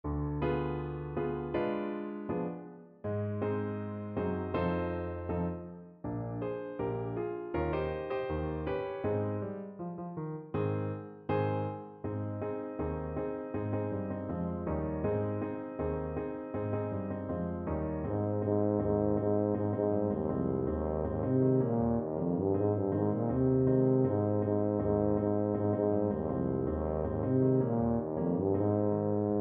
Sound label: tuba